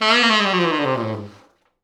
T Sax falls

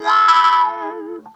DONKEY, Funk Guitar; Zero G - Funk Guitar

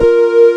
AN SYN, SYN & LEAD; SAMPLE WAVE MIXING 2

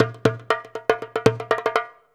119 DJEMBE, Modern Percussion Loops

